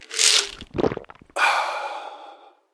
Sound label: pills use, pain pills